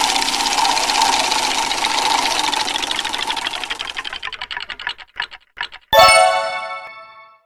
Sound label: wheel spin; BingoGrassland